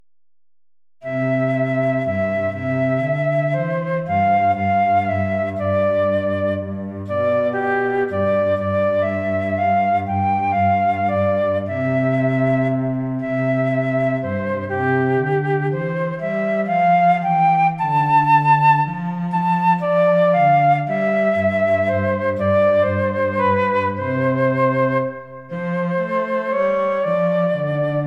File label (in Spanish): danza cortesana para flauta violonchelo